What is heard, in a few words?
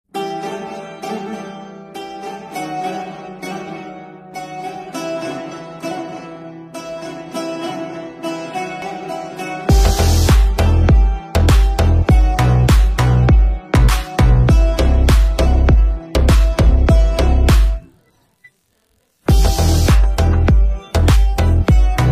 song ringtone, no vocals, instrumental; leo